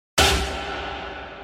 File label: vogue drop; memes